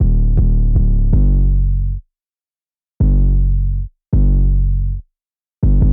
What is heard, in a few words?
Bass 808s Aggressive Before